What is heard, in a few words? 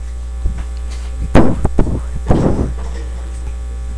bowl